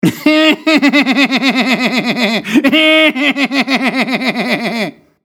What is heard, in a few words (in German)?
vox mann fieses Lachen